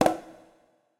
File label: button Continue; Feature Splash Screen; scruffy duck